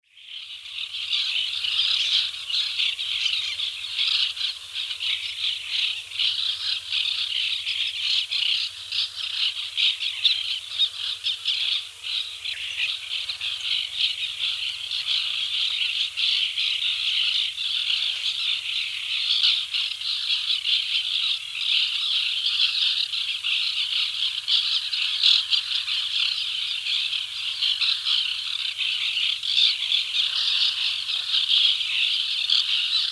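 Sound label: white-winged tern voice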